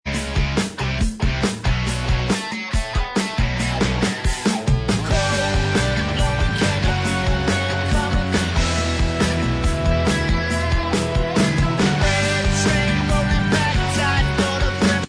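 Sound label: Instrumental Track, backing tracks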